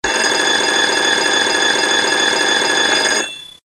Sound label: RING LONG; WorldCup